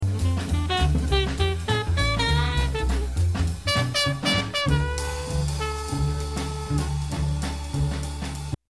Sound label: ramblin shared motif